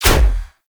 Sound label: Dash Impact; Warframe; Focus; Operators; Operator Wraith Movement